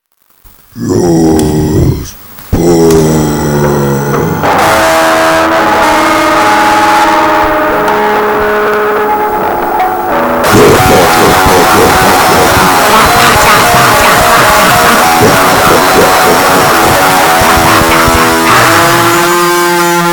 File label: jazz